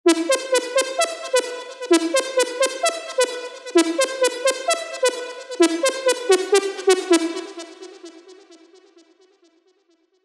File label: Plucky Lead WET (Em 130 BPM); FUTURE TECH KITS